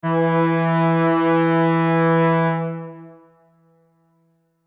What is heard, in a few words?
Ship Horn Bright Long; Boat Sound Effects; vehicle sound effects